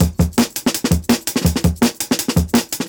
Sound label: Jungle Breaks